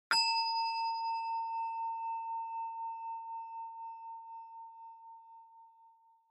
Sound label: Ding High Long; Onoma